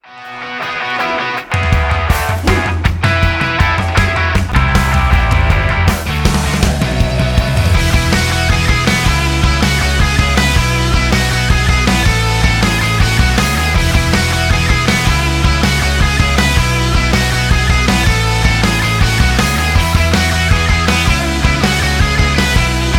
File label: marble bgm; marble race